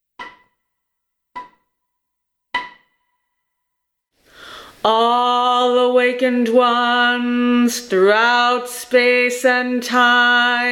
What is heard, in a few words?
Awakening Together English, Zen Chants